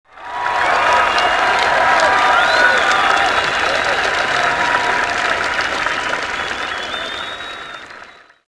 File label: win